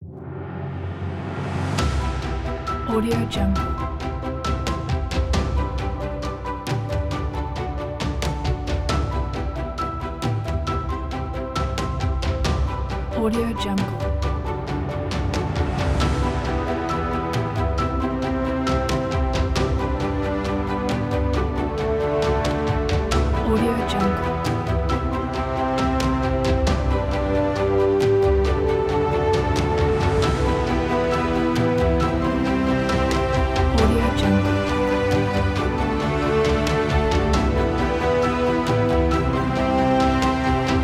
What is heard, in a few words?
uplifting corporate adventure